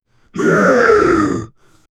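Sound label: tribal warrior grunt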